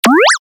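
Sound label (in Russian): синтезатор эффект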